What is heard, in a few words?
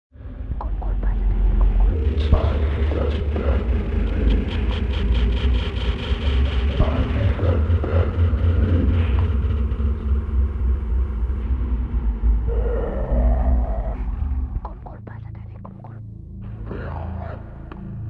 Ambiance; From Dusk Till Dawn